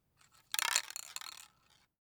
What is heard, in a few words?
spray paint